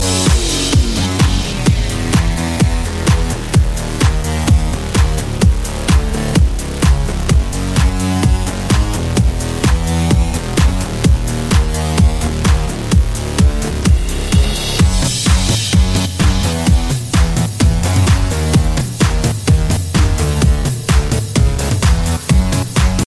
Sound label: Fierce Tone